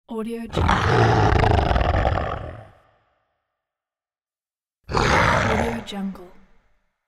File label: lion roar